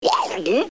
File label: Letter Vac Swallow; davidson